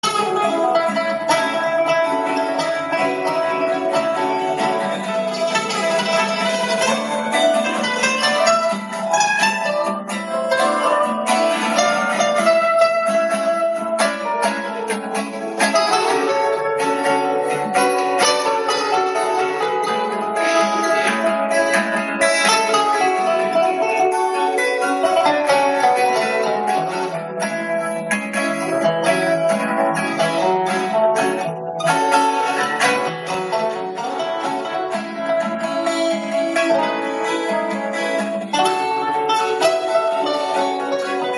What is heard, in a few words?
latin improvisation